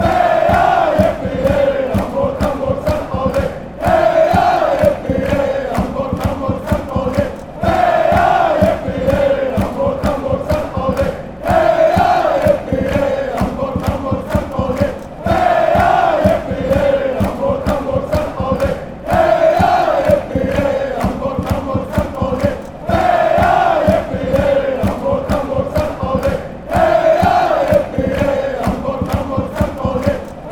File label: yipeeyay